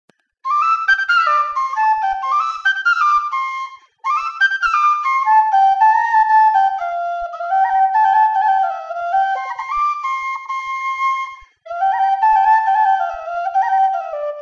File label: Whistle